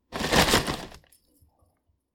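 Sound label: rustling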